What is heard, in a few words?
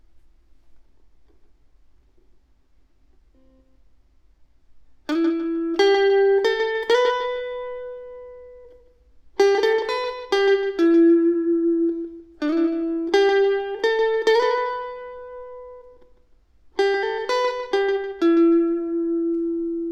Elect Guitar